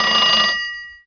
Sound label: alarm; BESTSHOT; noisybit